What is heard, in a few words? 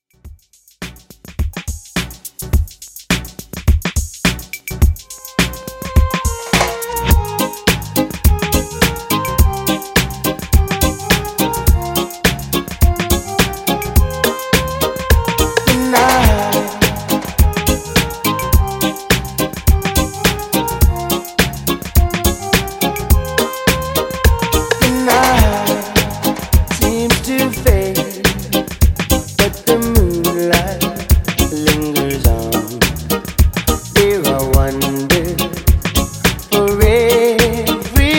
80s Reggae Redrum 105 Bpm